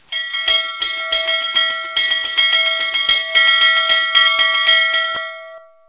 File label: bell